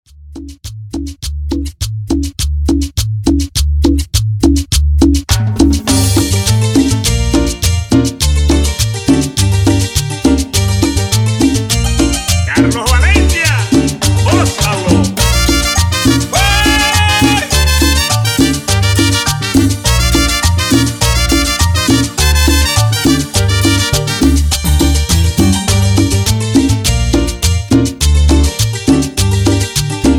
Extended Cumbia Colombiana, Bpm 103

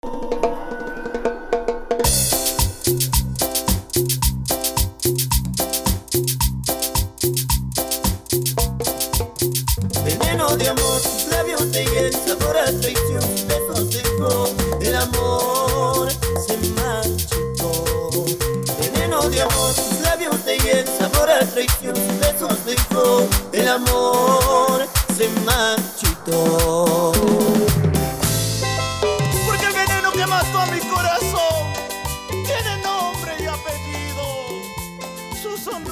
Intro Acapella Perc 110 BPM

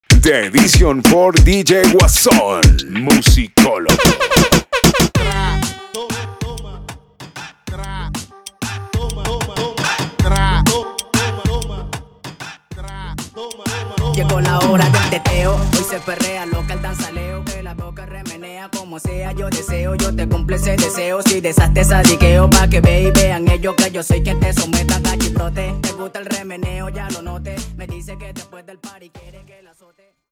REGGAETON INTRO OUTRO 95 BPM

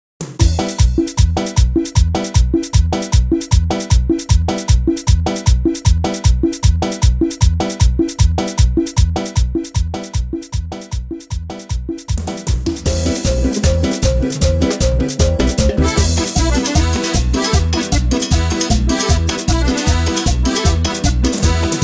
Chicha Intro Simple Bpm 154

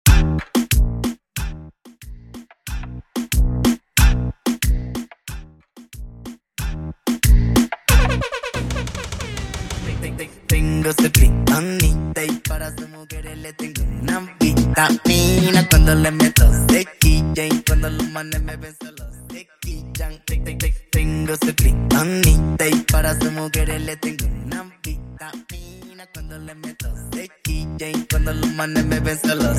Break Intro 92 BPM

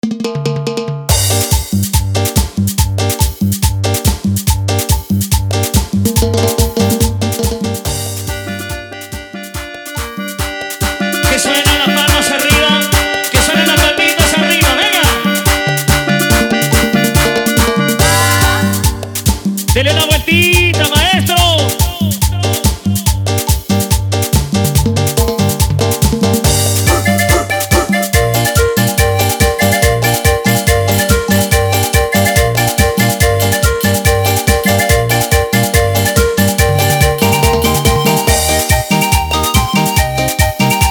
Chicha Instrumental, BPM 142

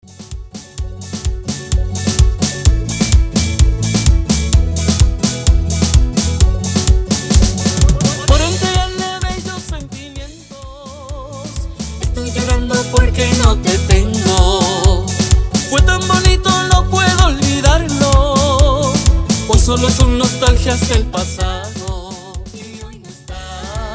Intro Acapela 128 Bpm

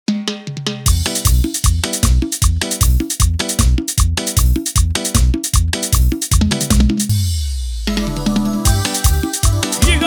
Instrumental, bpm 154; Dimax Dj Mix

